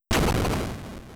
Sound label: Bomb; mother1